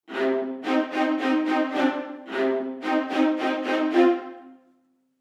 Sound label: Strings 10 Vas Staccato